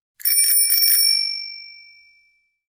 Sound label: Bicycle bell; China Telecom